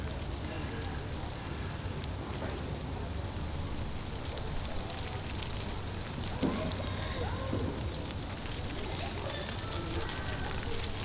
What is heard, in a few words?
Windy Square Dry Leave Parade